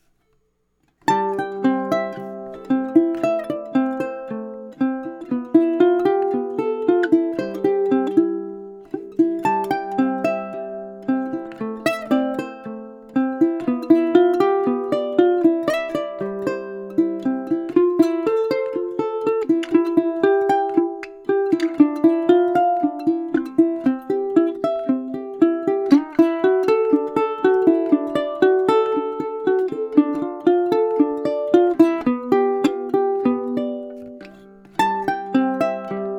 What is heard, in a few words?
Ebony, ukuleles